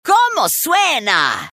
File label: jazz; rocktopus